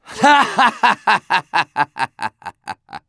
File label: laugh happy